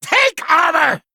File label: Male Kobold Attack